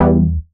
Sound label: Stab Rit Emin